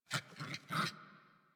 Puppy Idle Growl Playful; Enemies; Vocals; Kubrow; Warframe

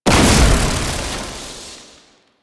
Alchemy Grenade Explosion Electricity; Warframe; Entrati

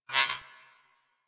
Beep Comby; Warframe; Ambience; Corpus; Beeps

